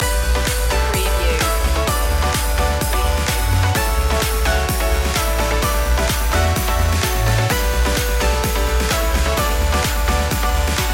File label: fitness dance loop